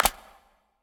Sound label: clap; smite; ahpuch skin10